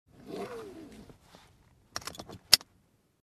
Buckle Up in Car

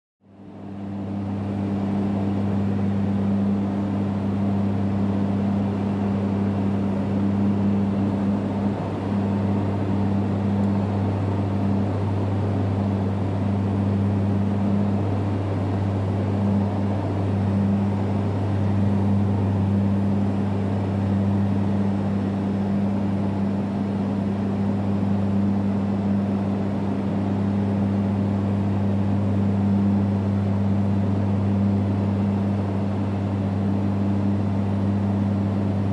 Cockpit Ambient with Engine Hum

